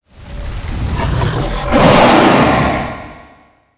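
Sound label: Dragonneut skill; Seer; GamigoNA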